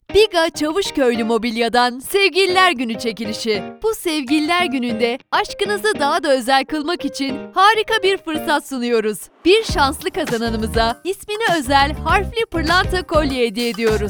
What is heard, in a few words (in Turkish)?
müzik; cavuskoylu mobilya